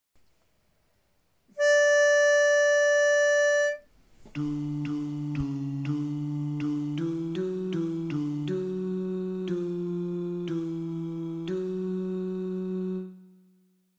Bari, harmony

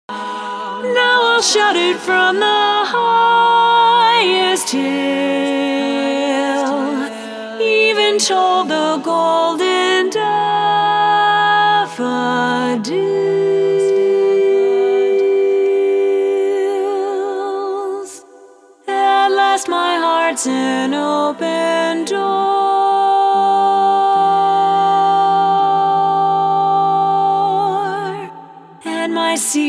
Lead, harmony

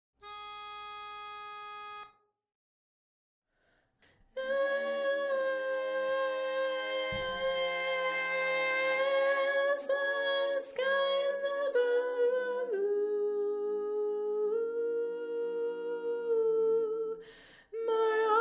Tenor

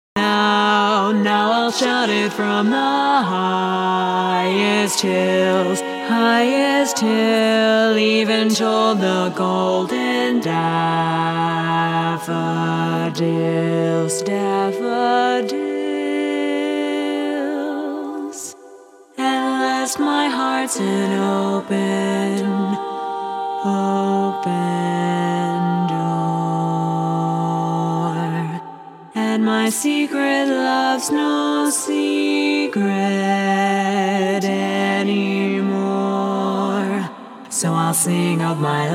Bass, harmony; from bar 37

